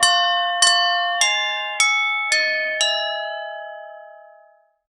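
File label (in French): carillon doux et feutré, bells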